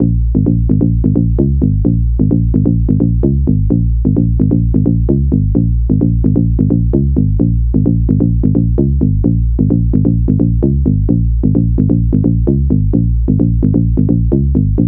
twilight bass

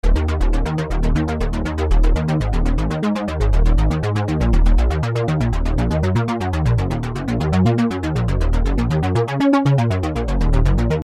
arp bass

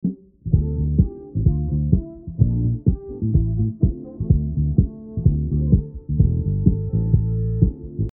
filter

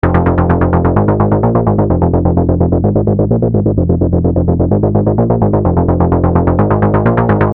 arp 2600 arp bass

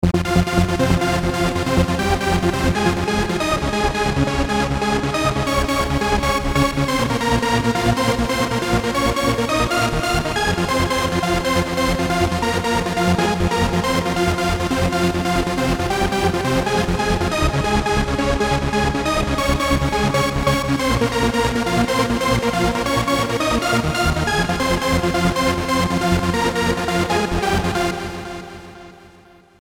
trance

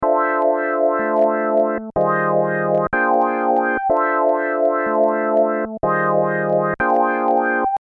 wah

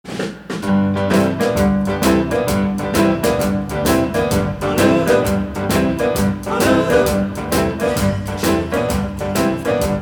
vocal removed

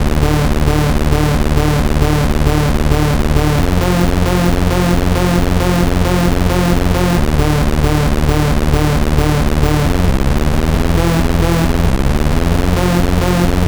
bubble saw

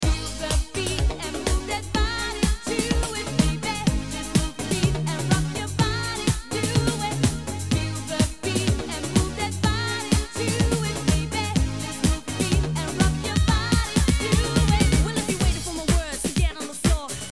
feel the beat

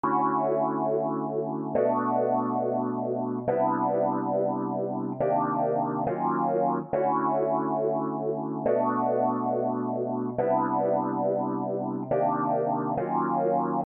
wahwah

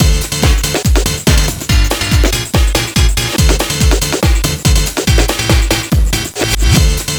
Piano Full Stab

